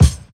puffy top end kick sample d key, kickdrum sound tuned to the D note